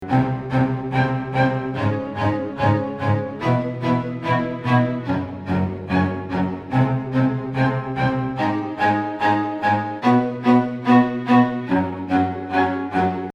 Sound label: low n hi strings